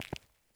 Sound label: FOOTSTEP Asphalt Trainers Walk Slow mono; Phasmophobia